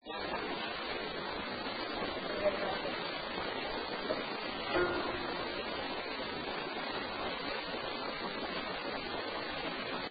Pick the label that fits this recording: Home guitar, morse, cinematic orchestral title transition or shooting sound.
Home guitar